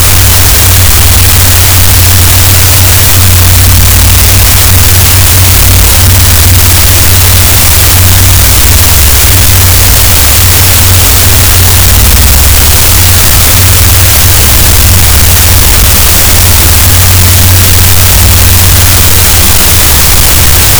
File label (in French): cachalot, gaussian noise; Physeter Macrocephalus